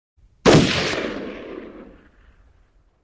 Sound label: Ar15 Automatic Rifle